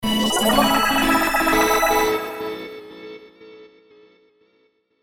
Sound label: M1 Strange Calliope